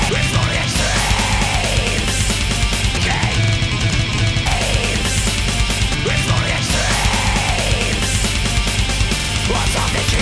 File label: metal recompose